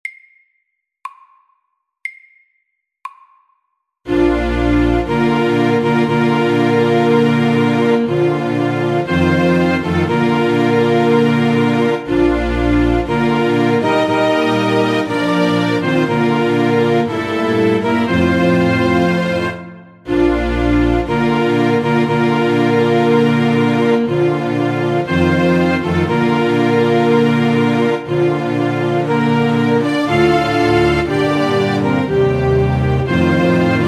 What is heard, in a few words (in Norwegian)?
Brudemarsj SAKTE